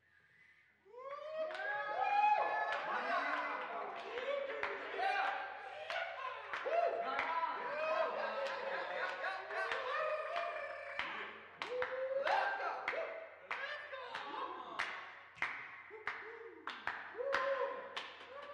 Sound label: Jazz Club